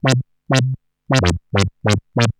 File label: Arp D Line, ARP Odyssey; Lines; synth; musicradar-synth-samples